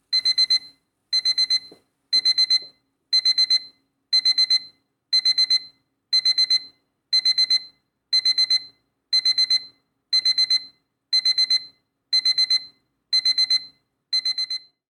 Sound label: sample of the alarm sound